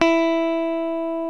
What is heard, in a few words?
LES PAUL